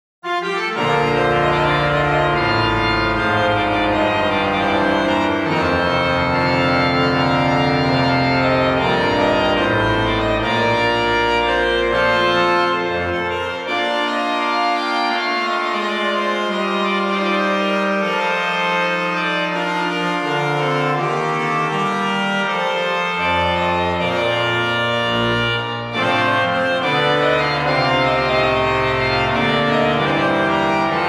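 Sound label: Dialogue, organ; 1714; Boizard; Thierache; Chaumont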